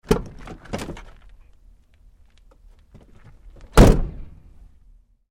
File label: Truck GMC Savana Door Open-Close Hard; Trucks; Vehicles; 2010 GMC Savana; BigRoomSound